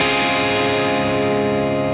4 6 5 6 4 Dbmaj7

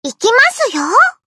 koto

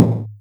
drum hit normal

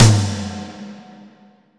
snare tom

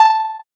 soft hit whistle

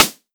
hat pedal

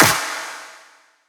clap wet

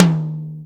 TOM

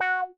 F#5 meow

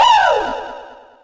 woo

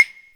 soft hitclap

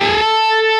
solo bend full

